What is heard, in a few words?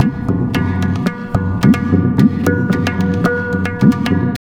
Tabla, 110 BPM; Zero-G; Pure Tabla